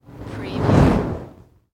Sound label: Torch Pass By Whoosh